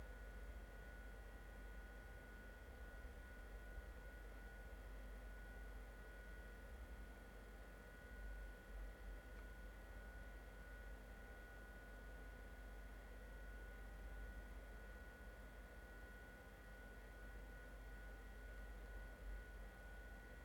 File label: noise